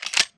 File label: smartpistol reload mag in; Titanfall 2